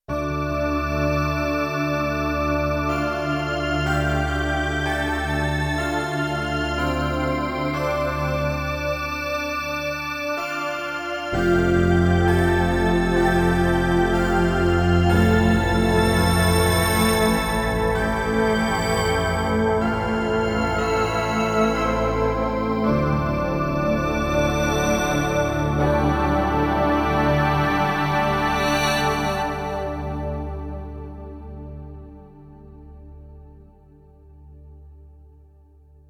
Roland D-70, Factory Performances

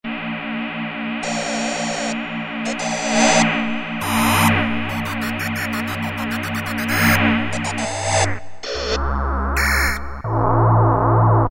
Machines, Roland MKS-50; Factory examples

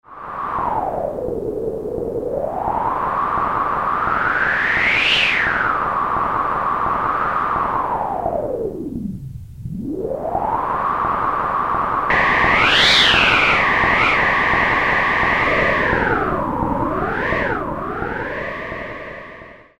Wind, Prophet-5; Sequential Circuits Prophet-5 (Ver.3); Factory Patch; File 3 Programs

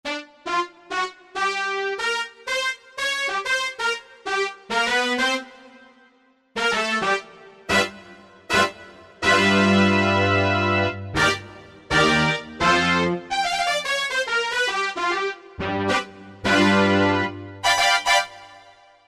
Brass 2, Korg M1; Factory preset examples

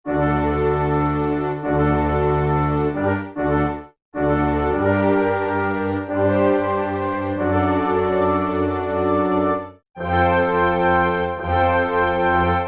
Orchestra Unison p; Roland L-CDX-04 Strings And Orchestral Percussion

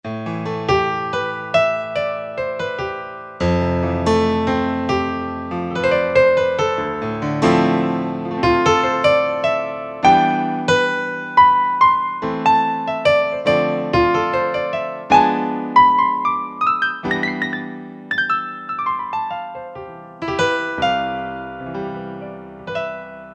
Piano2 8', Korg T3

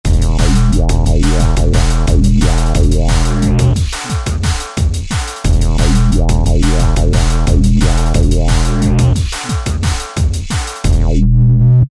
Filter Bass; Korg ES-1; Factory Patterns

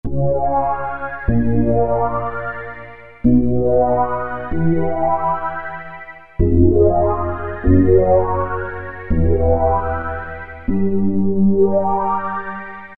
Thud Wah, Roland Juno-106; Factory Preset

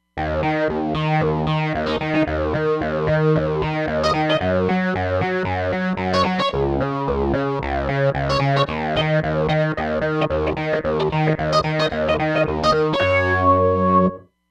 Synthi Fizz, Roland Fantom XR

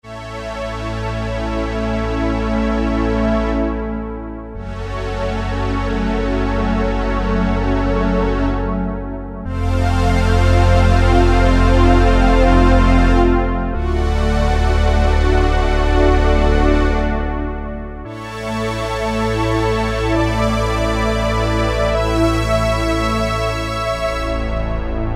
Slow Huge Strings, Roland MKS-70

